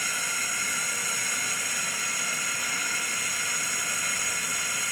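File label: steam small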